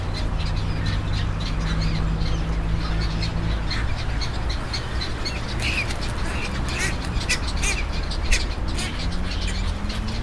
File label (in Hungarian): pásztorgém, Arrecife; lanzarote 2017; allathangok